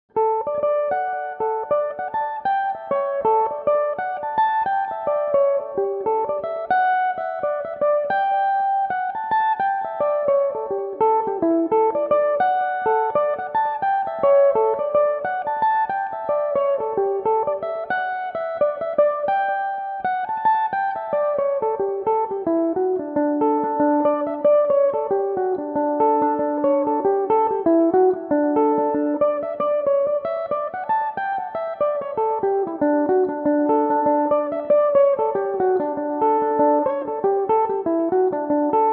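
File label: jig, D major, mandolin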